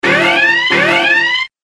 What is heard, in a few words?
alert